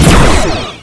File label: Pulse Photon, Weapons